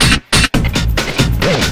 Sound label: LOOP SD, Drum & Bass, 140 BPM; Ueberschall; Drum & Bass Flash Trackz